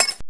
staff key; ambience

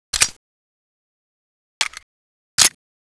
type 68 reload not empty, weapons

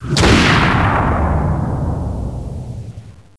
BB Hit

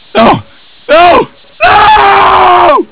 scream

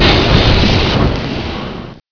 burnin hell

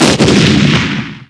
explode; diekrypta

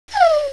headcrab pain

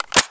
clip insert

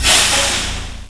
suck

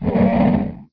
zombie poison idle

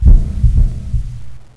heartbeat end

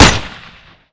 fire, weapons

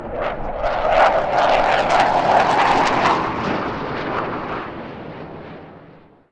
flyby

